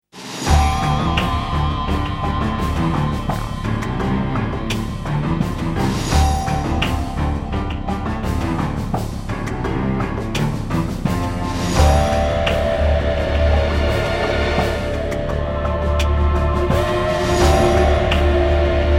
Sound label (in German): Marsch